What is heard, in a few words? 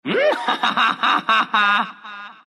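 laugh, Chinese; Bane; dota2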